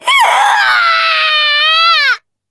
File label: in pain; rin